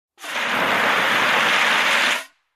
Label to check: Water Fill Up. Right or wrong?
Right